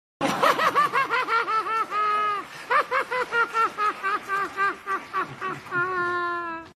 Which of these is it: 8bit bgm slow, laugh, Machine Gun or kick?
laugh